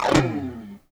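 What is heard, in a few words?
chorus slide 1 L, guitars; Spectrasonics; Hans Zimmer Guitars VOL-1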